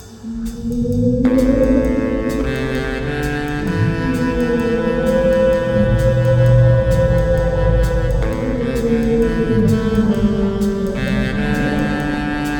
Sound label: Whale Sex Jazz; Monster Prom 3 Monster Roadtrip